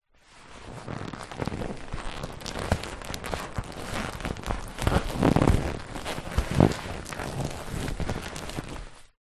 leisure lilo or rubber ring inflated body movements squeaks creaks; zapsplat